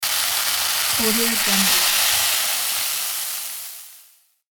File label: short hot sizzle